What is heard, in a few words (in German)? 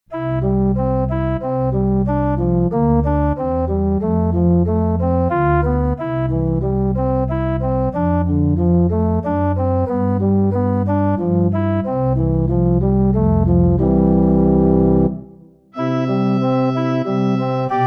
Oboe Solo + Orgel-Playback; Zum Sanctus (Heilig heilig)